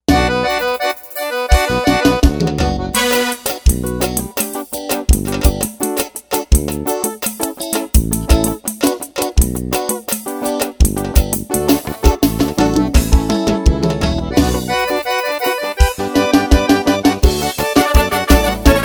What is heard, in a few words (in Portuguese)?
eletro forro